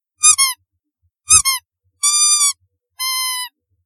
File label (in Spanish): patito de goma